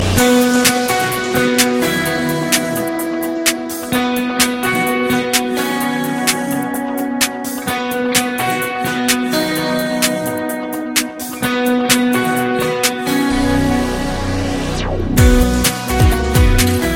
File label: Te Amo Guitar; Ringtones